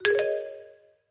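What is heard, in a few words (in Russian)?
звуки для викторины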